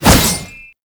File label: Shield And Sword Whoosh